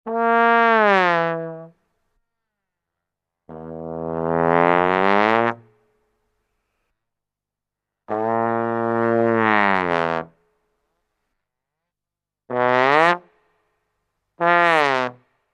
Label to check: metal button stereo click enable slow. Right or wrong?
Wrong